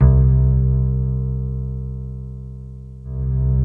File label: C2, SynthLine